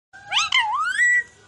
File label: parrot; mithu